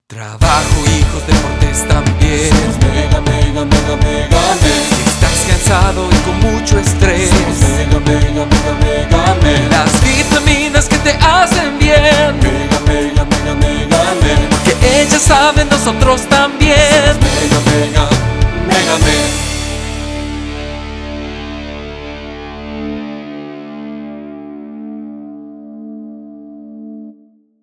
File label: jingles; Mega Men